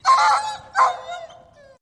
Shepherd Dog Yelp

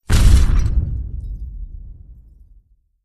Explosion Small Glass

